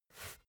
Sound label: basketball turn swipe short quick